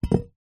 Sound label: foley glass sheet small square drop on carpet